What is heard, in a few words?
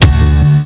Got the bonus; Querkz